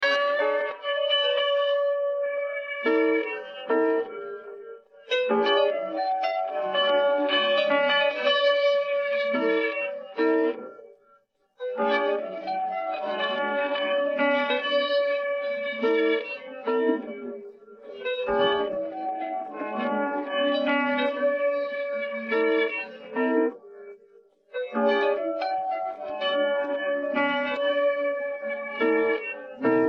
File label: guitar